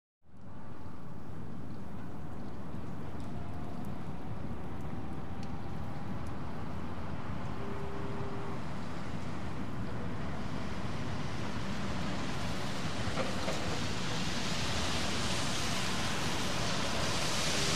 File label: Car Exterior - Slow & Wet / By And Away; wet traffic